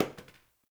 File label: Janitor Paper Towel Drop; Ambience; Gameplay; Warframe; Nineteen Ninety Nine